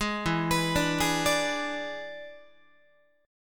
E 7th E down Guitar; E7 arpeggiated; JGuitar

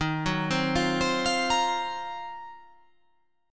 D sharp Minor 6th Add 9th D sharp, chord

